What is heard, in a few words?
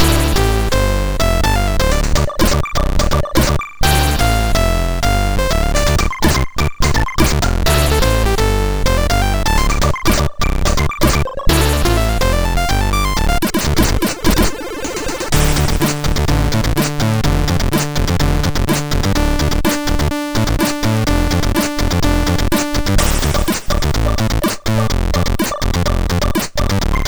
Stuart, MOS6581R4; sid